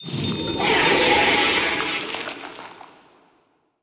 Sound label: Lithoid crystal; Stellaris